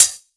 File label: Hihat, Psytrance; One Shots